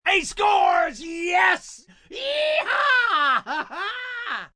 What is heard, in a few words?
Cheer He Scores, Cheering, male, sports fan; Crowd Sound Effects